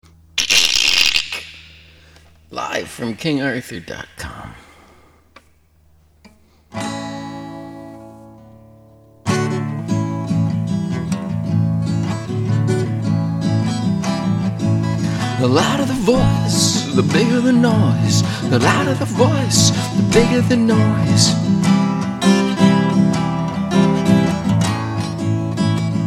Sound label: Voice Noise; Modern Times